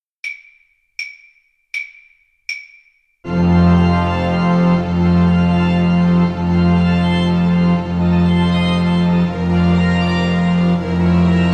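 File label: viola karaoke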